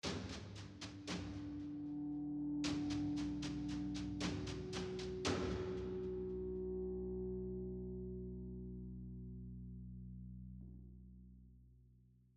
Tension Block

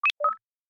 Digital Click

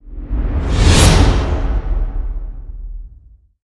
MARCH REVERSE; transformers war for cybertron gamerip

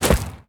Fishmen Footsteps Dirt

